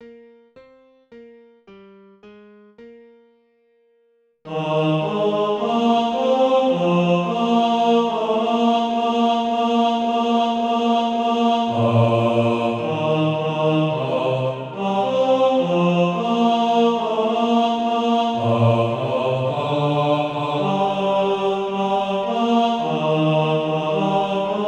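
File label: bas, Practise Music; SATB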